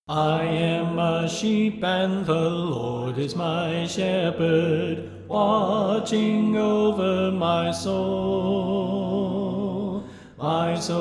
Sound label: Soprano Left